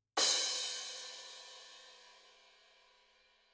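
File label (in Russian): барабанные тарелки звук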